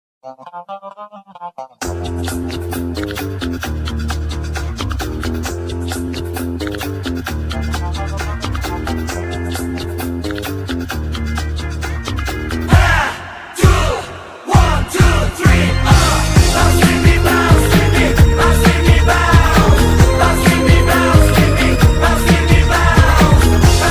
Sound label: disco